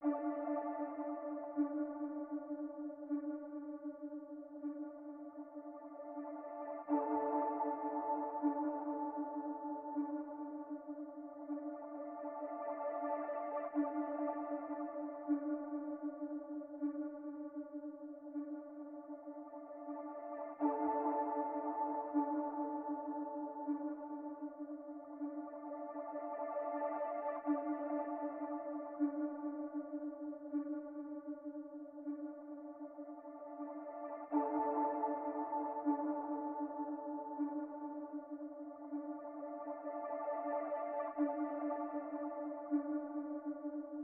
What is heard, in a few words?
140 BPM D Min Accent, Drill; Melodies; Loop Stems; Armed